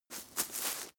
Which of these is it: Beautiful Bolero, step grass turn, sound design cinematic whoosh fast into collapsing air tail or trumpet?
step grass turn